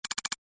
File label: roll